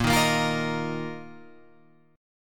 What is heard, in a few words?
A sharp Suspended 4th Guitar Open F; strummed; A#sus4; JGuitar